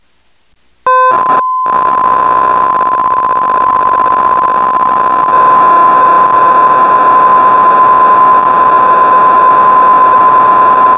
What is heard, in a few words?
abandoned mine